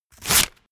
tape rewind

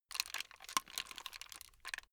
plastic rattle slow short

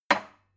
glass put down cupboard hard

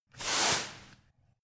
broom sweep soft

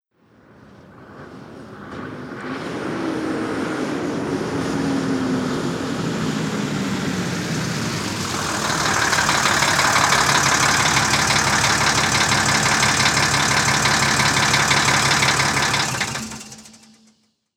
diesel truck arrive stop

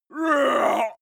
male shout hit

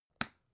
folder clamp open soft

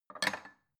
bowl take

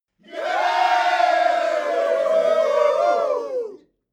male crowd cheering short

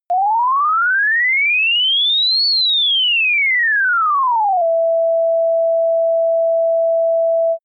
general rise and fall sine

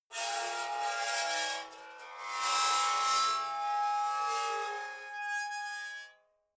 metal scrape rich slow

